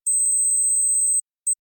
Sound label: Beeps Data Transmission Technology